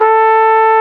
FLUGEL A4, WIND; AKAI S-Series CD-ROM Sound Library VOL-2

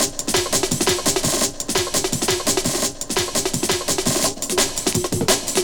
Drum & Bass Beats & Breaks; Sonic Foundry (Sony Creative Software) - Fluid Dynamics Drum & Bass

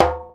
djembe; Univers Sons; Basicussions

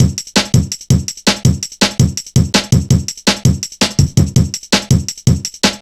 165, drum loops, drum & bass; Total Drum&Bass

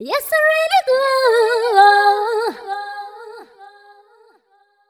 f 128 mel e, fem Vocals

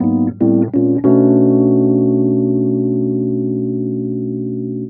RHODES; Houseworx

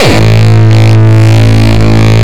Longkick, kicks

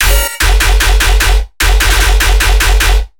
bass loop Industrial Process; Virtual Riot

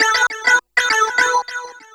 SYNT, funk

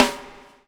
BUBBLE; Spectrasonics - Backbeat

